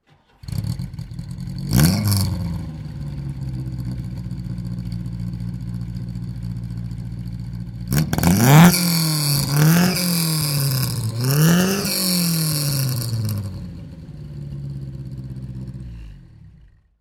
Lancia Fulvia Fanalone

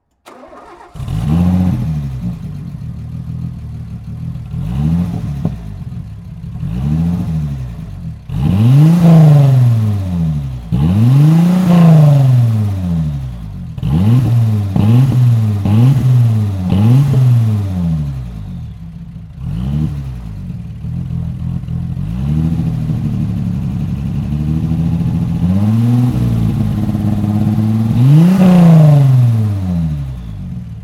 Venturi 260 Cup 1991; Transcup 260

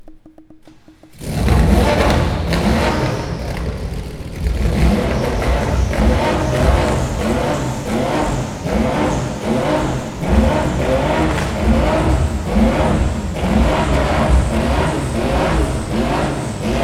Porsche 911 GT1; Porsche Soundnacht 2018

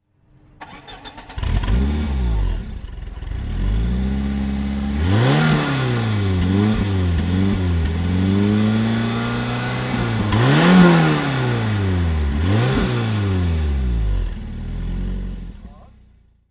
Peugeot 205 GTI 1991

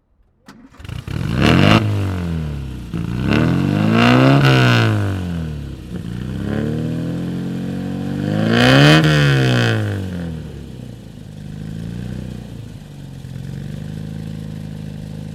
Rochdale Olympic, Supercar; 1961; Supercar fuer Selbstbauer

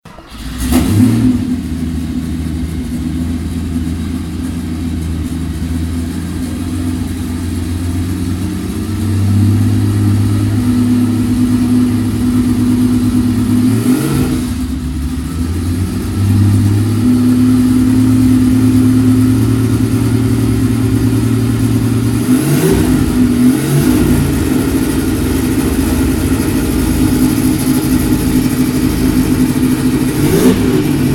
Porsche 935 78; Moby Dick; Porsche Soundnacht 2016

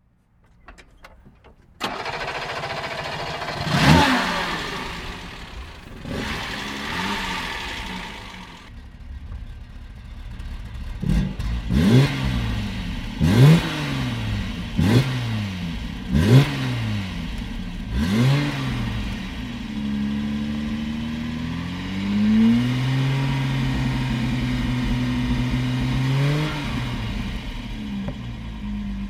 Fiat 2300 S 1966; Coupe; Eleganz-Komfort-und-Sportlichkeit-vereint

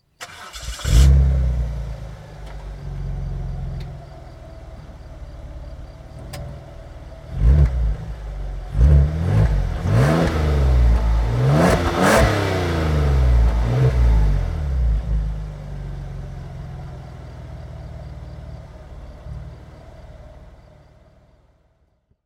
Peugeot 406 Coupe 2003

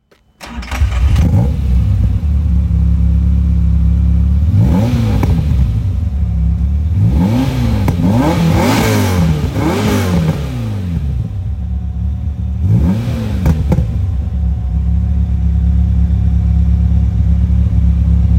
TVR Cerbera 4 2 1997

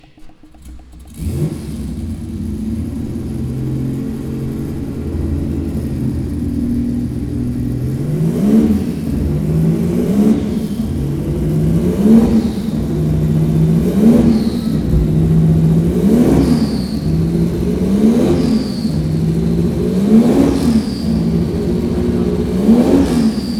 Porsche 935-78; Moby-Dick; Porsche Soundnacht 2018

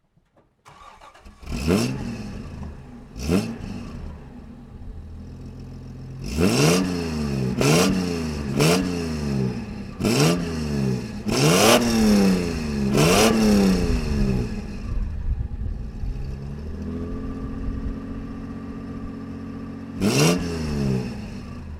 Toyota Celica Supra

